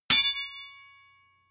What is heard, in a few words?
sword impact metal ring heavy